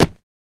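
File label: Hit Soft Single, cloth movement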